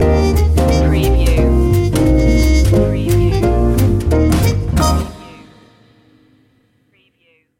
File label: in the bleak midwinter jazzy stinger